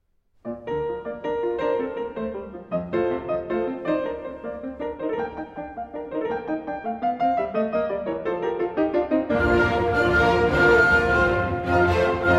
Piano Concerto in B Flat Major, Rondo. Molto Allegro; Piano Concerto No. 2; Op. 19